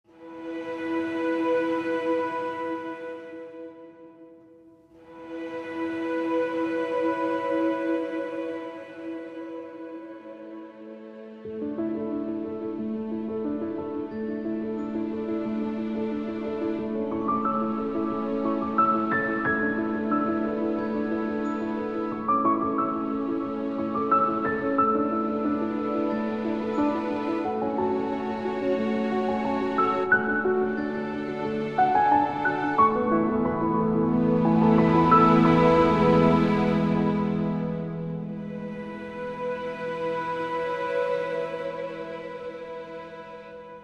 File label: Bright Family Hopeful Piano Melody